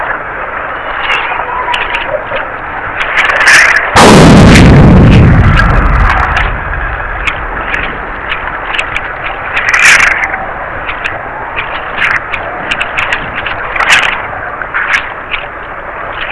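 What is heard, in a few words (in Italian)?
sonificazioni eventi sismici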